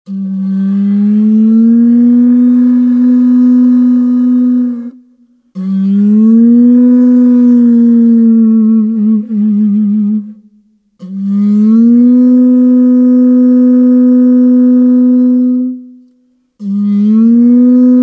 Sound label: conch trumpet